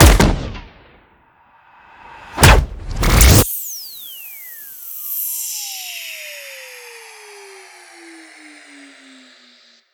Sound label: Bullet Extraction; Plastic Surgery